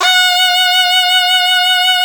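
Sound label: SHENAI, Shenai (Vib)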